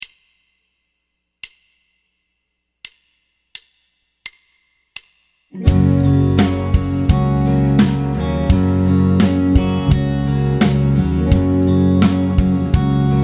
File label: Triad Vamp MAJ Gb; Triad Vamp Gb Major; Piano Practice Tracks